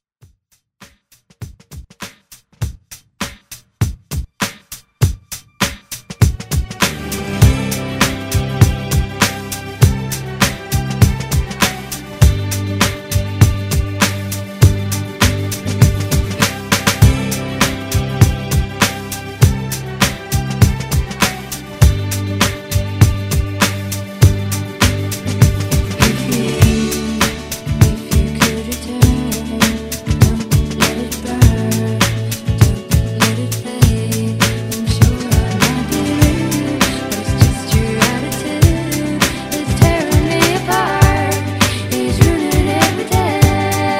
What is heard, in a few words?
90s Rock Redrum